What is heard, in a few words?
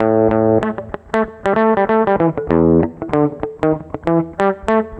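distortion guitar; USB Soundscan vol.31; Miro s Grooves; AKAI; DISTO GTR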